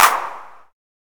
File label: big hand clap sample d key; single hits one shots